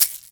Household Shaker, household rhythm samples Hits

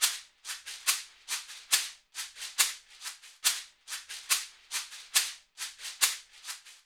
SHAKERS; Spectrasonics; Liquid Grooves 1 & 2; BUBBLY

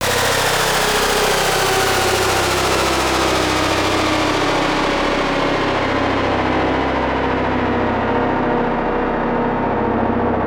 suspense, special FX twisted; USB Soundscan; AKAI

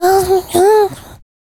VOICE FX, Dance Vocals; Best Service ProSamples vol.12; AKAI

